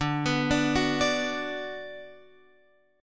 D 6th D down Guitar Open F, chord; D6 arpeggiated; JGuitar

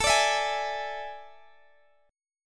A Major 7th Suspended 4th

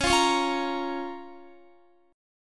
Db 6th Db, down, Guitar; strummed; tuning=4E,4A,5D,5G,5C,6F

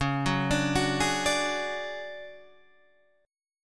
D Major Sharp 11th C sharp, chord

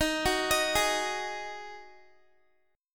D sharp Minor Double Flat 5th, Guitar; arpeggiated

